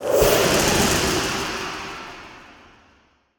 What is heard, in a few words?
Skill2 Particles; portal quest android; Blue Mage